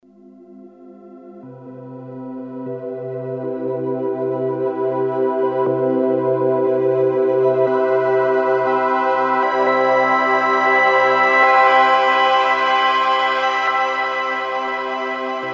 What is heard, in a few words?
Long Pad S-H+AT, Novation XioSynth 25